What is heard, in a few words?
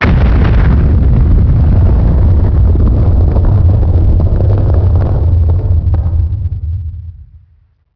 explosion distant; Max Payne; subway